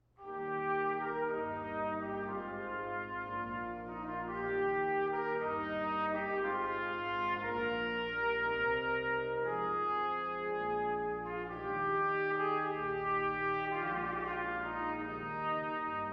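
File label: air from water music trumpet and organ; weddings; handel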